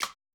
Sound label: Woodblock Hit; 090 Emin; Hip Hop Exotica; Apple Loops; Big Fish Audio